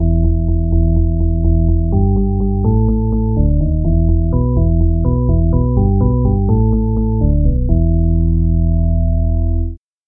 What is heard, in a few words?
drawbar organ bass